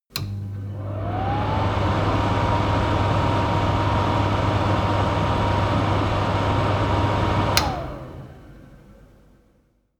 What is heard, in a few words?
stove extractor fan medium